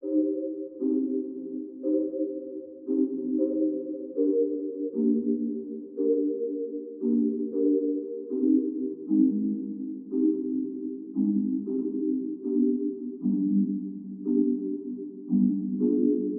116 BPM C Min Pad; Loop Stems; Cymatics; Encantadora

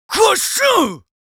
Yells, Viking Vocal

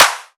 Clap; Titan Other Clap; Cymatics; House Starter Pack

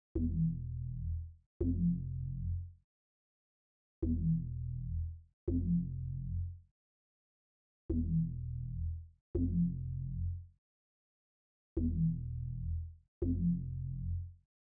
124 BPM A Min Bass; Loop Stems; Cymatics; 2022 Melody Collection; One Over

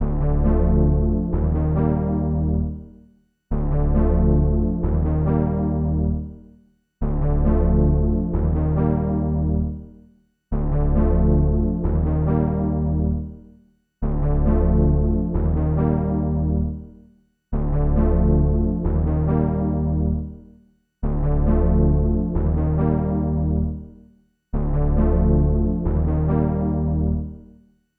137 BPM D Min Synth; Melody Loops; Synth Collection; Cymatics; ANALOG EVOLUTION Beta

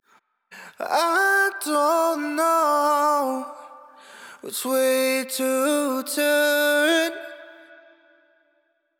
Sung Phrase Wet I Don't Know 128 BPM A Min; Cymatics; Euphoria Vocal Sample Pack

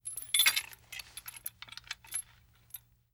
Key in Lock; Fairlight Sound Lib

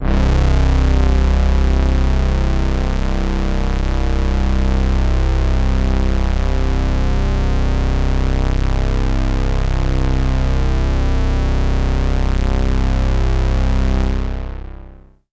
Prophet 5 LEAD Brass Low - C; One Shots; Cymatics; Fantasy Synth Sample Pack

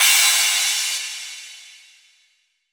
Crash Cymbal, House; House Kit; Drum One-Shots; GhostHack; Ghosthack Advent Calendar - Day 3 - House Kit

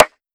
Hardened Perc; House; Drum One Shots; Percussion

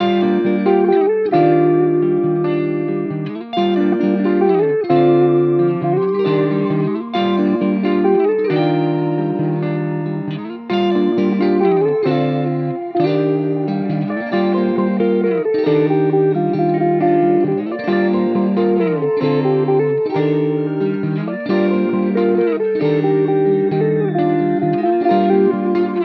Forever, 134 BPM G Min, Guitar Loops; Cymatics; Journey Guitar Loops

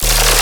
Bass Hit D#, Dubstep; Ghosthack; Dubstep Sounds 2025; Luster Bass

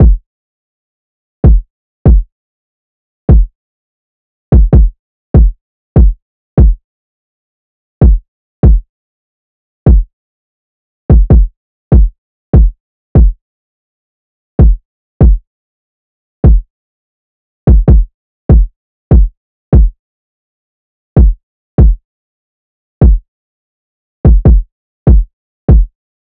Drum Loop 146 BPM Kick; Loop Stems; Cymatics; Bands Drum Loop; Mystery Pack Vol 4

